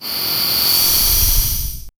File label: swishing air spinning